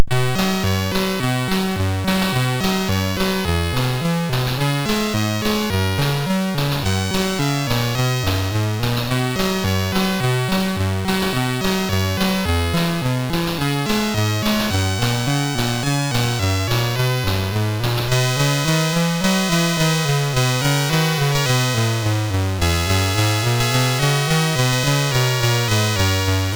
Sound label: Jingle Bells, sid MOS6581R2